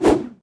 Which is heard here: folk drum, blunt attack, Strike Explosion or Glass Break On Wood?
blunt attack